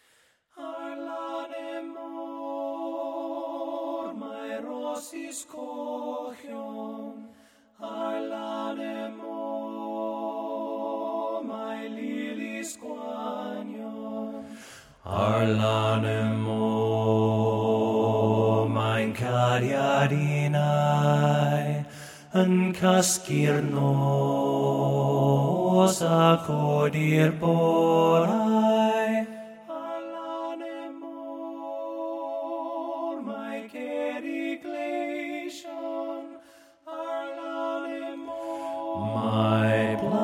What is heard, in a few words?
bass